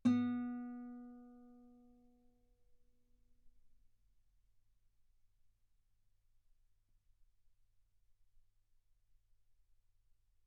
Guitar B3; ff; sul B

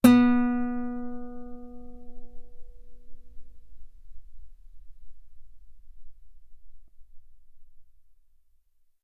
Guitar ff sul B B3

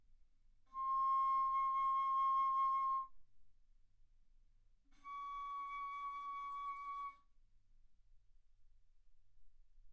Bass Flute C6 Db6; ff

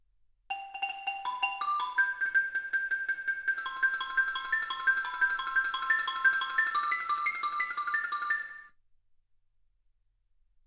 xylophone

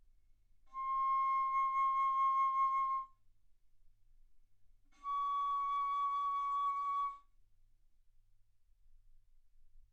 Bass Flute ff C6 Db6

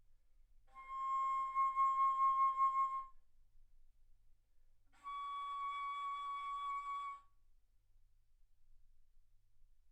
Bass Flute ff C6 Db6

